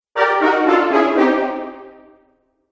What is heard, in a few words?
Cartoon Failure Brass; Cartoon Music